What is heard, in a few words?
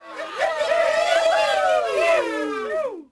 CROWD POS MED; Cars; XBOX